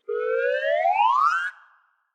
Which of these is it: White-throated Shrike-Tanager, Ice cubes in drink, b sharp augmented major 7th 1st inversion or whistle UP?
whistle UP